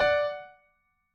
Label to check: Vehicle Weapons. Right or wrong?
Wrong